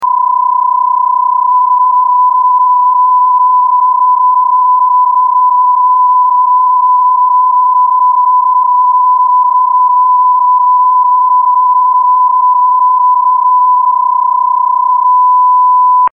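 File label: psk31, digital mode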